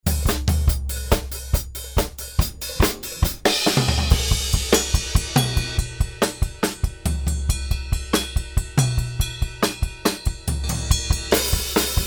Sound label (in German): Drum Set ohne Kompression Nur Dick Mic Spur stark komprimiert on off Stereo Summe